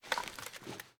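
foley assault rifle akilo47 drum reload fast raise; weapons; viper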